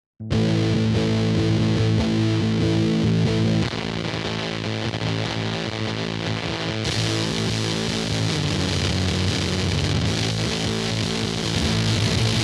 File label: bass destroyer; deathbyaudio; waveformer